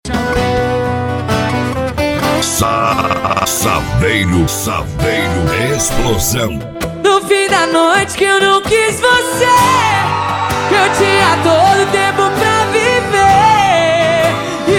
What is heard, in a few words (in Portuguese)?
sertanejo; SAVEIRO E F250 EXPLOSÃO